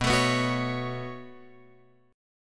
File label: B 7th Suspended 2nd, Guitar, Open F; B7sus2; strummed